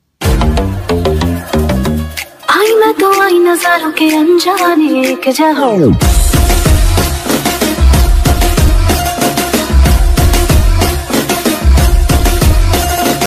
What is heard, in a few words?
piano cover instrumental ringtone bgm